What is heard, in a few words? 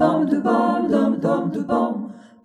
Jazzy Harmony